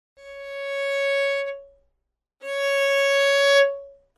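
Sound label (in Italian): Piano Forte, violin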